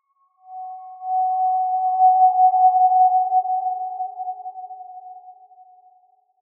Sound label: Eagle; Garden